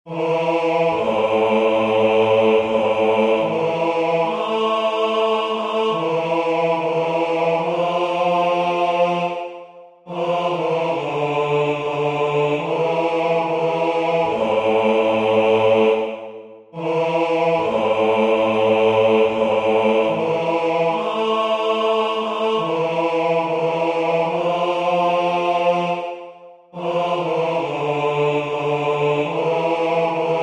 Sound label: bass; Waiheke Island Choral Society